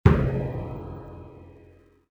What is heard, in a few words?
player respawn; Soldier Of Fortune II Double Helix; XBOX